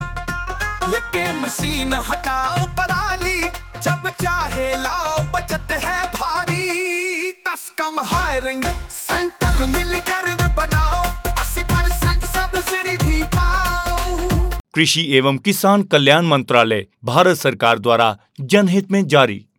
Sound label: hindi, jingles